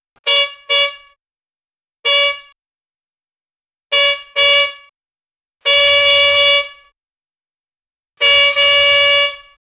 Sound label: three quick car horn beep